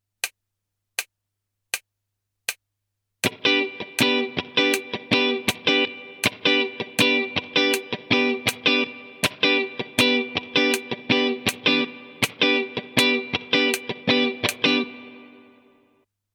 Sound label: funky rhythm